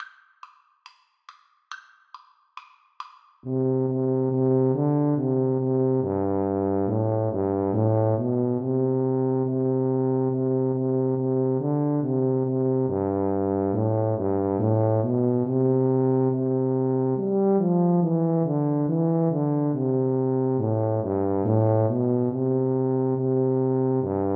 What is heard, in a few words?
Tuba Duet